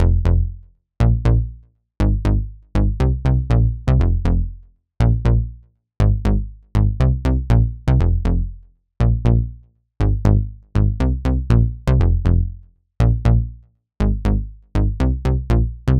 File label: Bassline